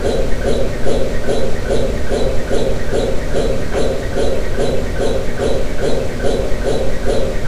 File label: Ambient Cryogenic